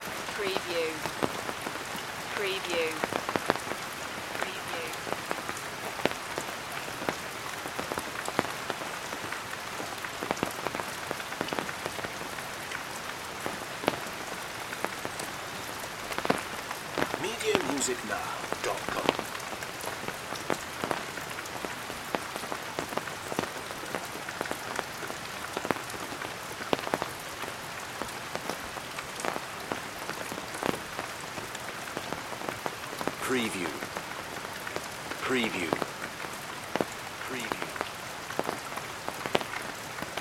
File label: tarpaulin raindrops